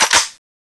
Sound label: draw, weapons